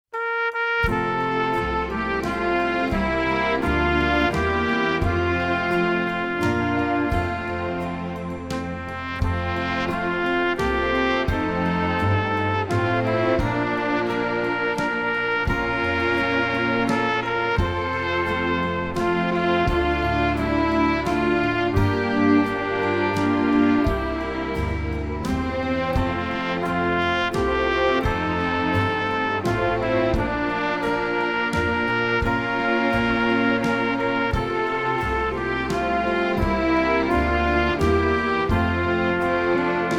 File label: Acc; Home On The Range